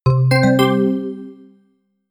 notification call

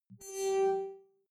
beacon flash; signale

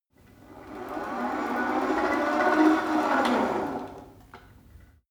rope trainer pull sequence slow short; sport freizeit; fitnessstudio

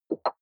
click fluffy chink

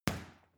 boxing punching bag hit medium; sport-freizeit; boxen

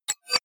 hover fast, signale; soundpool

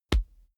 combat chest hit; krieg-waffen; nahkampf; soundpool

